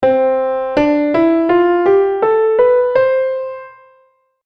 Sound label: C scale